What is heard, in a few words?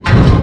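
Collision Interior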